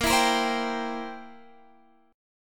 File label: C 7th Bb